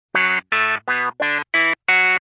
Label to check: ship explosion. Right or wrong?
Wrong